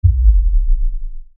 Lfe Descend Low Bass; Descending

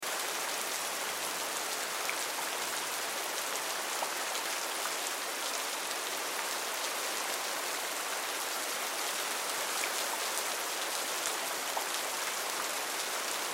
Rain Forest Steady Drips Loop; Nature; Weather; Blastwave

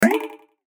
CLICK BEND RATTLE; Clicks; Blastwave

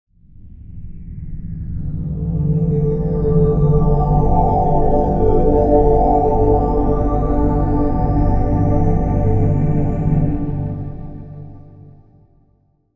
Logo Swirling Swell; Sonic Logo Sound Effects; Production Element Imaging Element Accent Transition

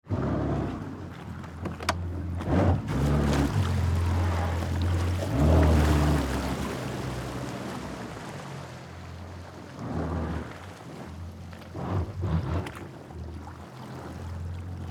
Jet Ski Rev, Watercraft, Engine, Shallow, Water; Transportation; Boat Sound Effects

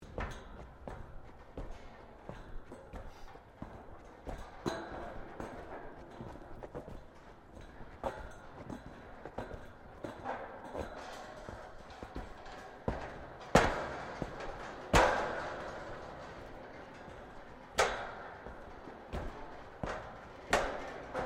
Footsteps Boots Suspended Metal Walkway Sequence; Footstep Foley; Human

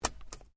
Seatbelt Clip Close, car; Hyundai Accent 2008; Hyundai hire car; Vehicles

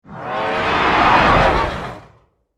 Science Fiction Space Demon Horse Scream; Battle (Sound Effects:Science Fiction)

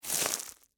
Crunch Ice Cream Cone, Soft, Debris; Blast Wave

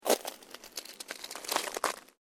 SNOW CREAK

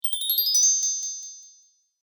Magic Fairy Wand Wave

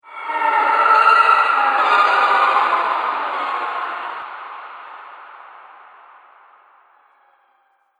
Horror Possession, evil

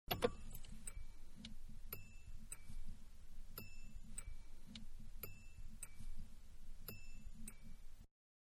Mid Size Car Ignition Bell; Vehicles; Cars; Manhattan Production Music

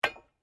SLEDGEHAMMER METAL IMPACT LIGHT

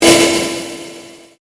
Convolution Pulse

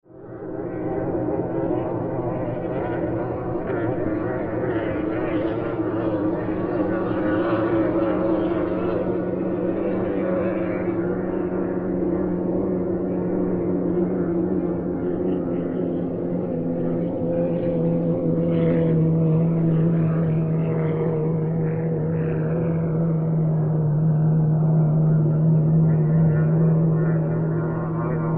Boat Race Hydroplane Distant From Shoreline; Boat Race Ambience; Boats; Sports; Blastwave

